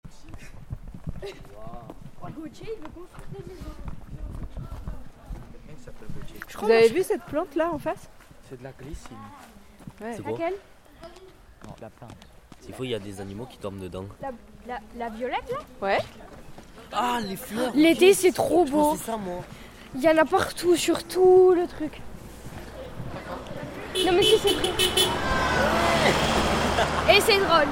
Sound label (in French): ambiance on marche dans cordes; college renee taillefer; ambiances diverses